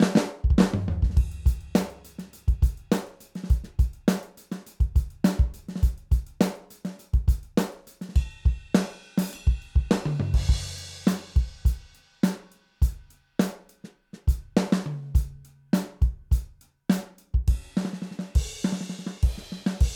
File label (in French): batterie avec light; flywheel